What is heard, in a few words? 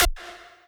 lead